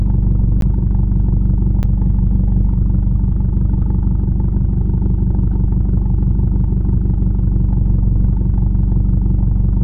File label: idle, vehicles cars; lwcars